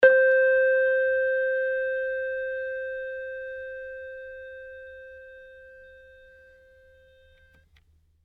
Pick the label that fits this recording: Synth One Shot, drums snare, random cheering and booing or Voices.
Synth One Shot